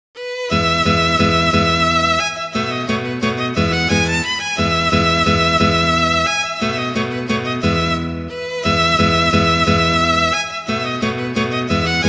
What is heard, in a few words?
Waltz; Paganini; Sonatas; Op1; Sonata No 6